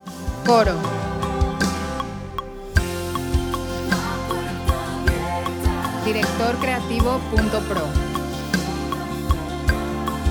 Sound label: F 78 6/8